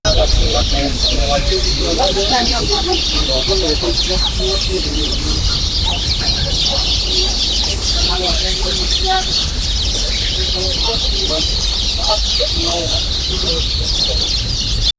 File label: we are in a village, animals